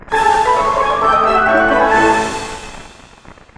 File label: free spin intro; eggomatic